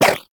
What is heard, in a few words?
Inf Throwing Star Whoosh; Infested; Weapons